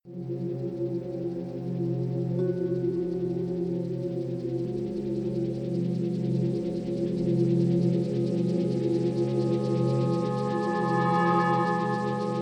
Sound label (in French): vibraphonies